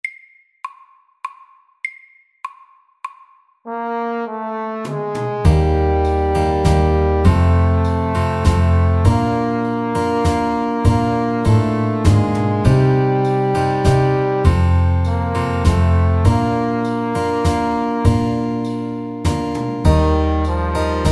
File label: Ballade, 100